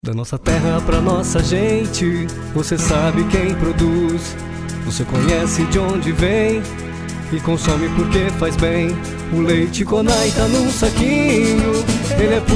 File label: jingle